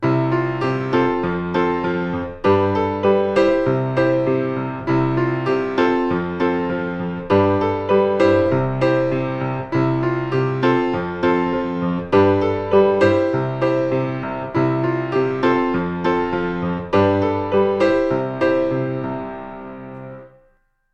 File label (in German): Synkopen